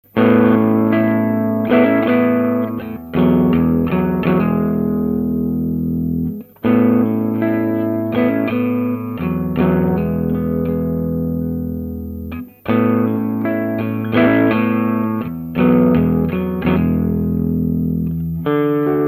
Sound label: Tele triode filter